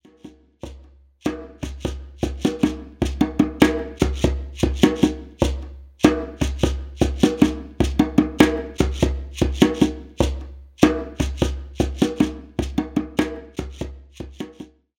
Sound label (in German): Einzelstimmen; Hygge